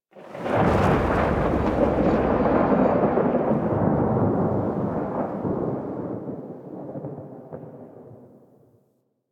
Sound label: Exterior Rolling Thunder; Ambience; Duviri; Warframe